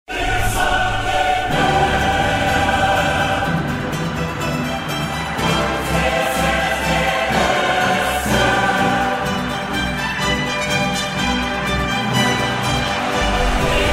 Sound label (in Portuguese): vinheta